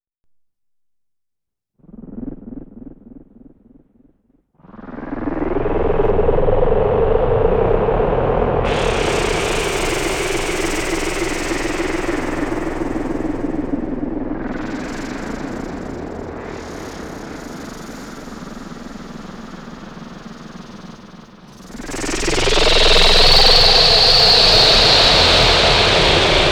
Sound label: Laughing insects